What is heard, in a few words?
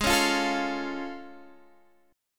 A Augmented Major 7th G sharp